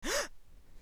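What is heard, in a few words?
Gasp; AssembleeSFX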